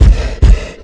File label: Guardian Run; Seer; GamigoNA